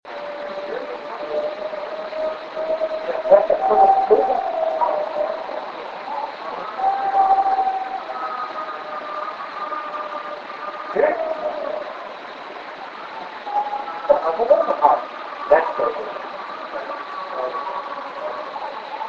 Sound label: Yell; The Kentucky Vocalizations